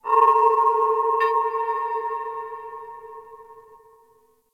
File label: chimes; Ambience; Warframe; Orokin Moon